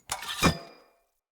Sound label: Player Sphere Polearm Equip; Weapons